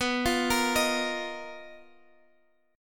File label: B Major 7th Flat 5th B Guitar Drop D